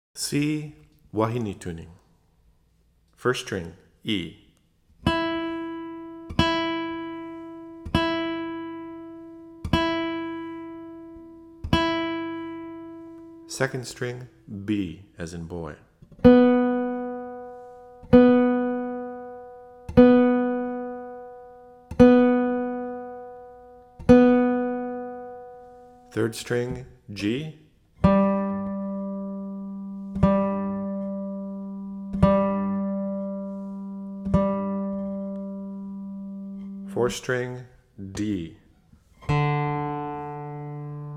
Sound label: wahine tuning